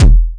Syn Dr, Overloaded Synthetic Drums; style kits

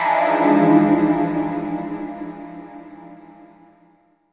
harsher envirojazz pad; Pads

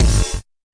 fx drum, Electro FX

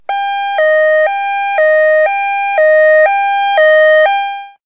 two tone siren; Alarm Sounds

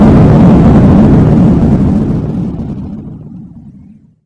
explode; VIDEO GAMES

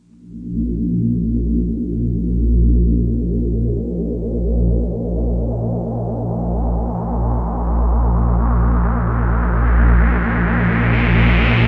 synth fade in

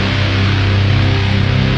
134 power guitar atmosphere; LOOPING AMBIENCE

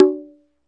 Conga, classic hip hop; STYLE KITS; Classic Hip Hop Mega Kit

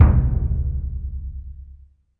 big burundi, African Burundi Drums; ETHNIC and WORLD PERCUSSION

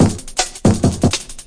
163 hardliner; 161 to 180 bpm

